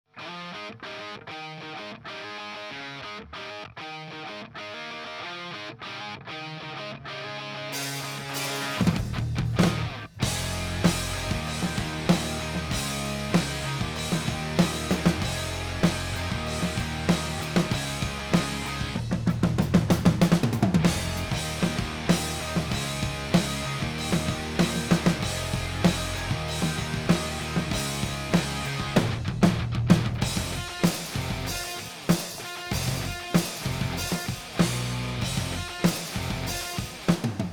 rock mix

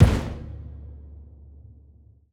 stomp; nova sound

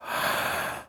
inhale breathing foley

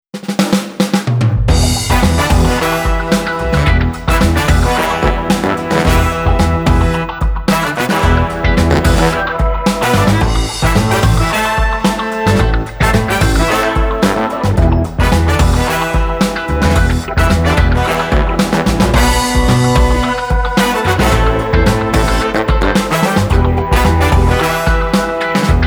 groove coaster

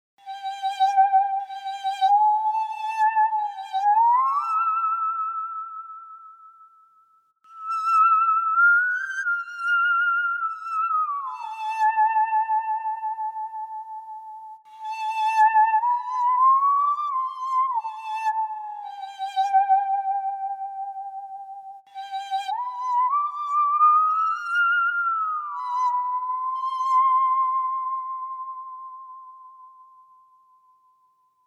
saw

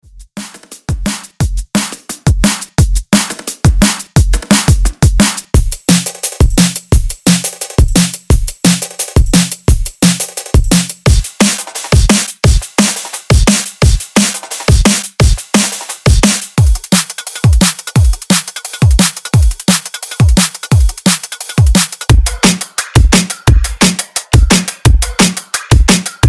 drums

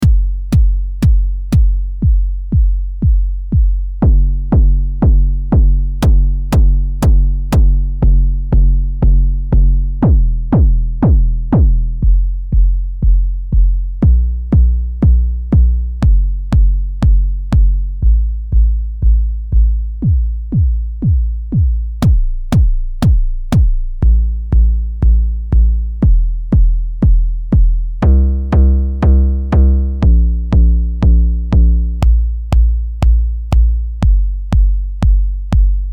analog kick generator

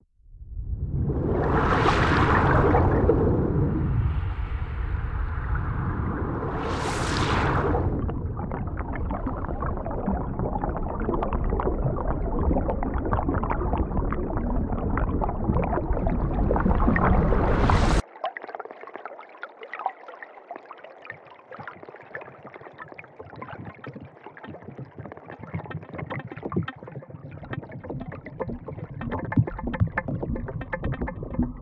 aquatic ambiences

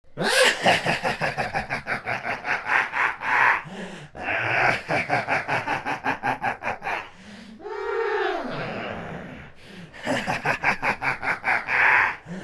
laugh deranged android

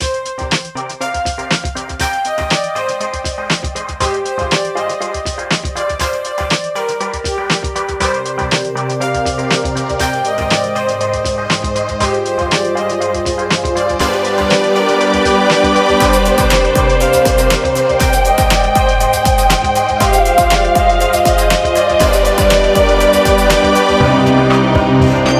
soft pads

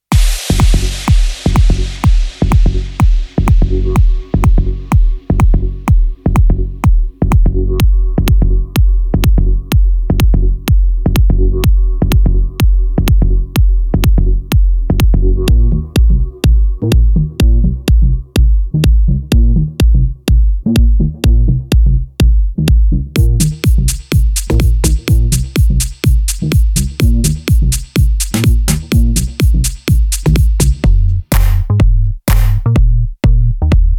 big kick pack; Dave Angel

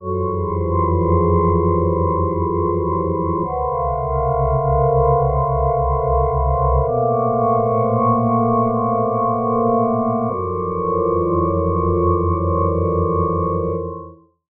atmo oneiric catatonia

